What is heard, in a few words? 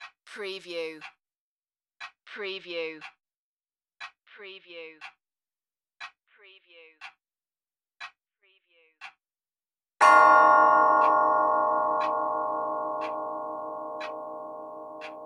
Grandfather Clock, Tick Tock, Hour Chime